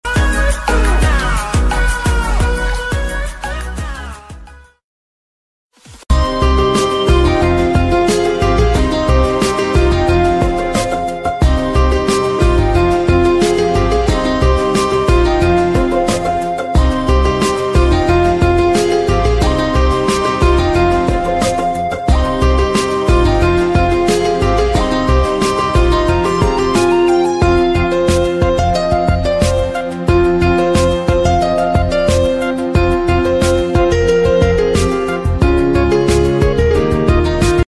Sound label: bgm ringtone; manmadhan